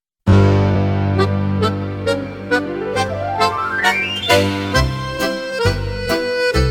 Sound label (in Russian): New Припев 1 аккордеон, Jazz for piano